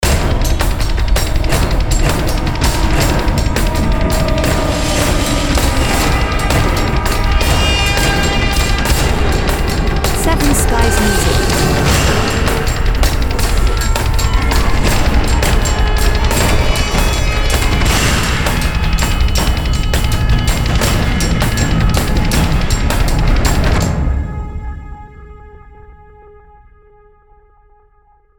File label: Dramatic Deck